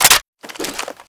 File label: ak47 reload clipout